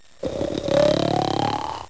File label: Snore; Treasure Galaxy (1994); Learning Company